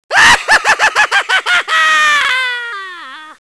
laugh

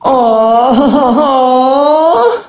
awwww

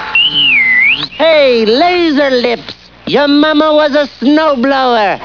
laser lips

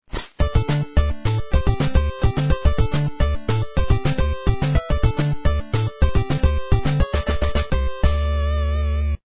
win; turtles; svencoop

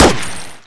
fire, turret; svencoop

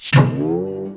toast eject; svencoop; teletubbies

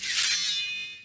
draw, katana; weapons

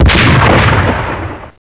awp fire, weapons; svencoop

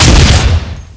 m3 fire, weapons; svencoop

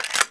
uzi mag in; firearms

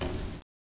footstep metal; footsteps; gearbox

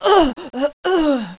shot, female

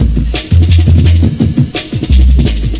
ratty bass; tfc

